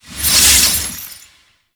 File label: Explosion Cage Disolve; Warframe; Warframes; Khora